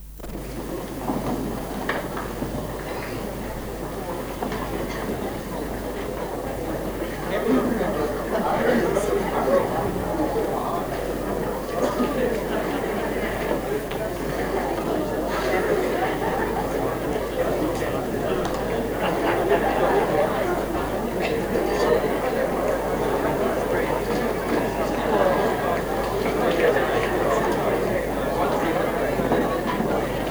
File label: Room noise; Ruddigore